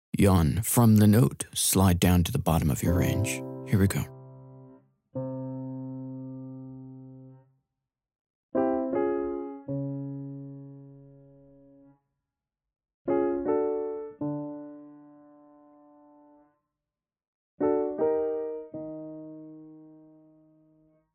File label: Vocal Full Range Yawn Exercise